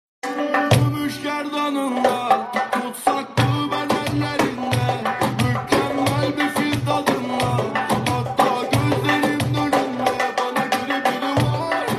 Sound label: Darbuka